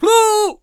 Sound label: Blunderbuss Attack Vocals